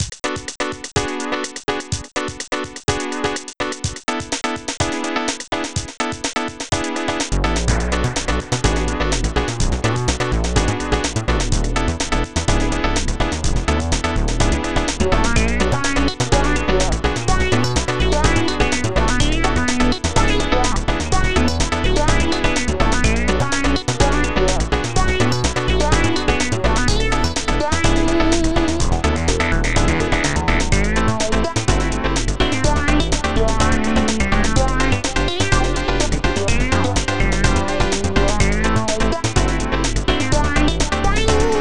gametune; amiga; razor 1911

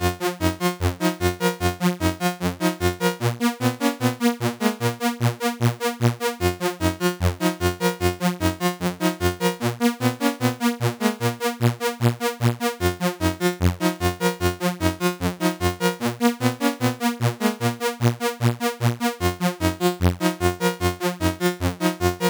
c64 in game; lazy jones

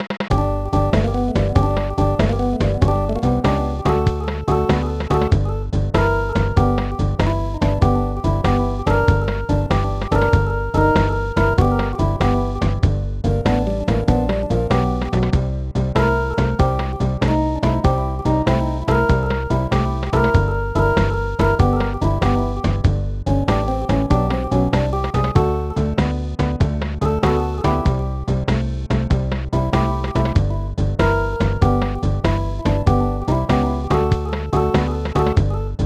snes blues; donkey kong country 3

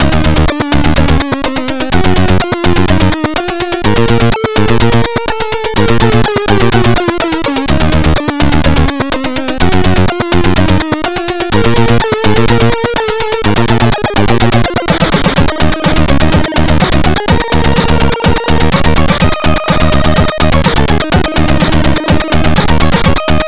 c64 in game; r type

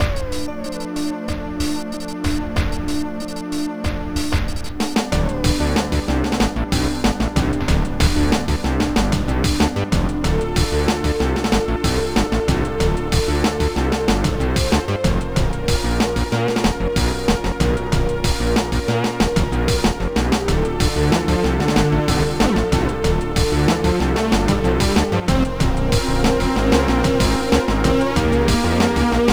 amiga menu; pinball fantasies

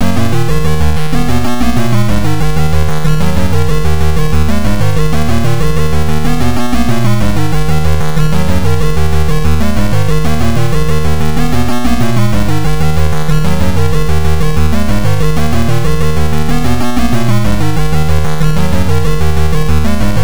atari in game; eliminator; philips

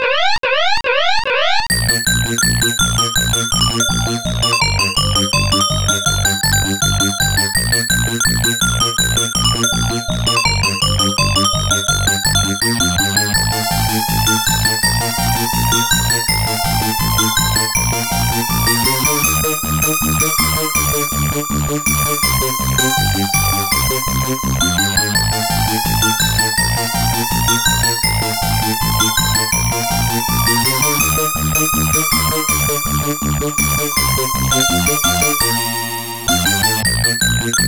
arcade hurry; bubble bobble

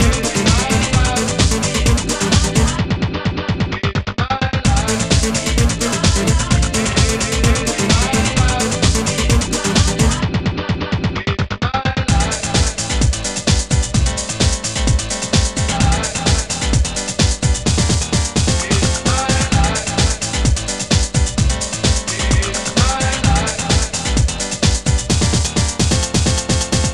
amiga techno

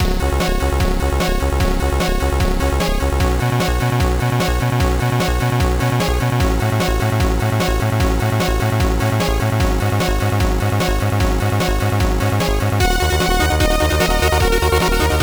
amiga in game; turrican iii

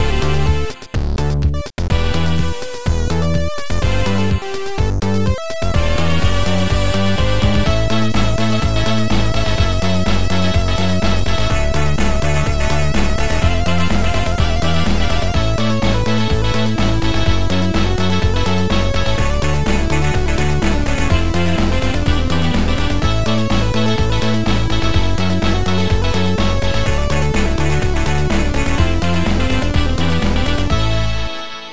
amiga game end; led storm

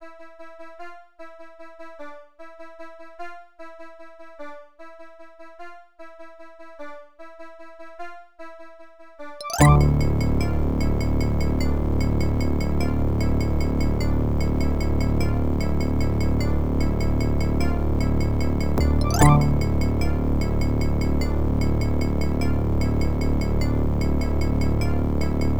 pc waltz, sbpro2; doom

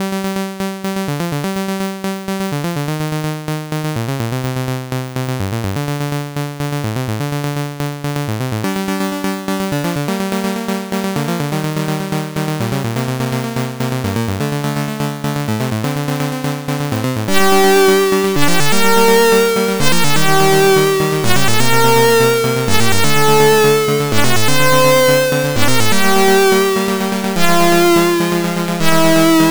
c64 loader; ocean

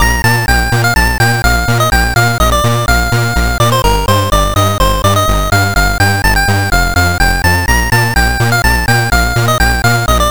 amiga in game; bubble bobble

